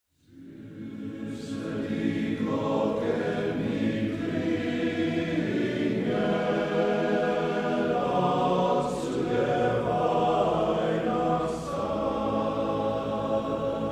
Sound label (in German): glocken